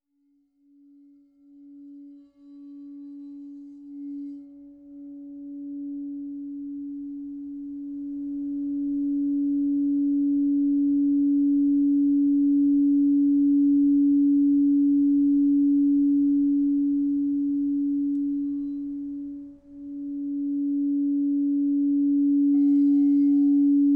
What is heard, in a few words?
crybowl